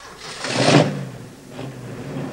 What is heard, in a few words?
Mustang Engine Strt S